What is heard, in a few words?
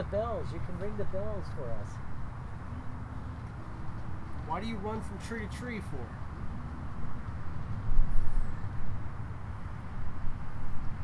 humming; Jefferson Pk